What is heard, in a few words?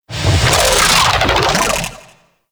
Sentient Vocal Death; Warframe; Sentients; Dolicholyst